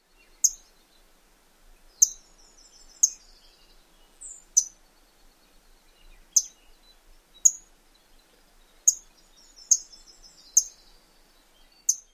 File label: chip calls; NY